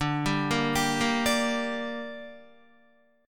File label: D Suspended 4th Sharp 5th D down Guitar, chord; arpeggiated